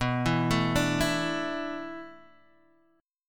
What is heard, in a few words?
A Suspended 4th B, Asus/B chord; arpeggiated; JGuitar